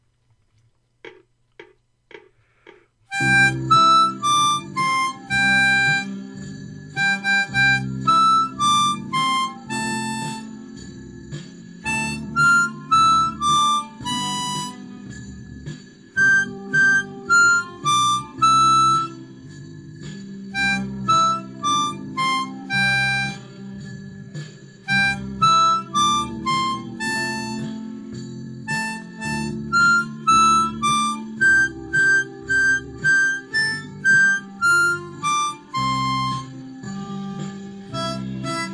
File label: Jingle Bells Whole Example, harp